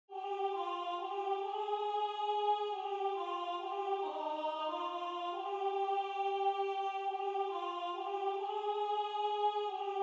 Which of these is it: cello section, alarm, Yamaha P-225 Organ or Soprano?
Soprano